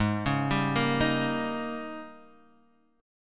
G sharp Major 7th Suspended 2nd Suspended 4th Guitar Half Step Down